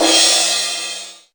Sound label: crash